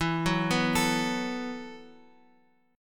E Suspended 2nd Suspended 4th E, down, Guitar, Open F; arpeggiated; JGuitar